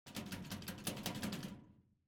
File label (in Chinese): 晃动